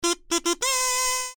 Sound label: kazoo